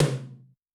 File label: Rock, Tom, Yamaha DTXpress-II